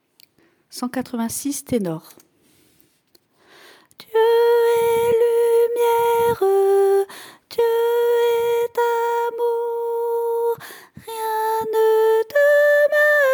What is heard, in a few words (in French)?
ténor